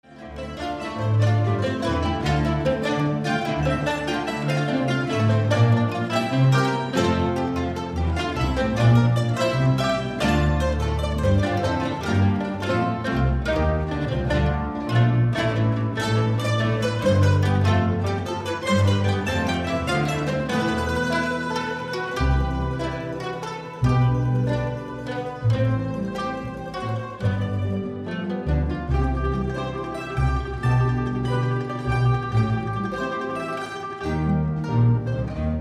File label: Mandolin Moon